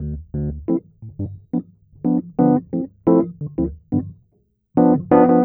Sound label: E. Piano